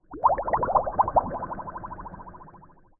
liquid

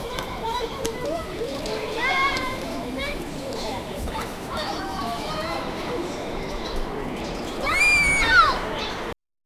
kids

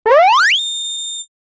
cartoon pop appearance

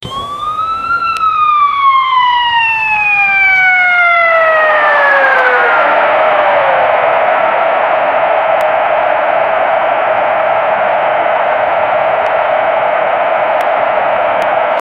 the single sound of a, law enforcement

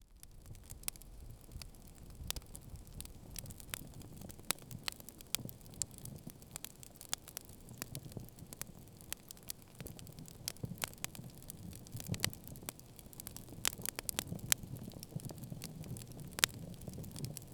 rain and fireplace in out, indoor

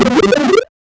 wrong sound effect retro, retro game

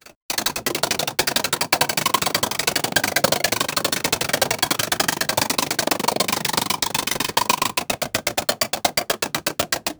dirt mining with pickaxe, pixel art

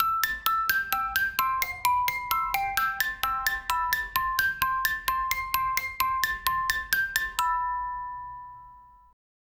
chinese music box 130 bpm

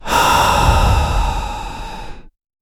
realistic human, anxious

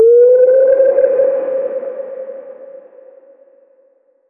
a little brink with a, synthwave

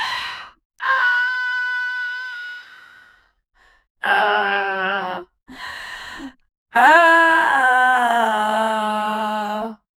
sound effect low pitched, human vocalizations

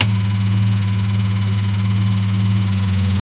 a 2000 hp hypercar

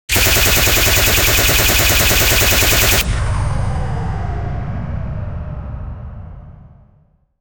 sci fi laser blast gun fi; gun fire

